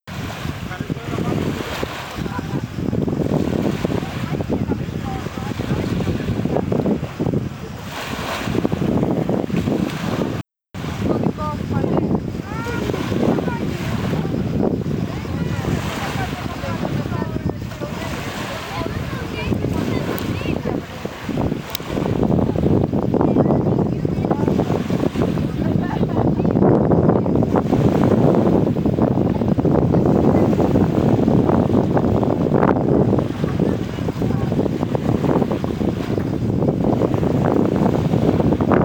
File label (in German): Zikaden; Greece; Original Video Ton; Fieldrecordings; Tango Mar